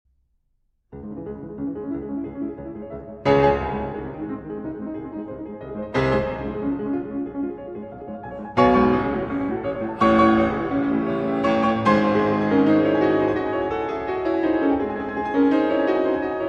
Piano Sonata No. 14 in C-Sharp Minor, Presto Agitato